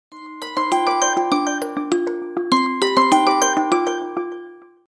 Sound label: Harp - Vivo Original Ringtone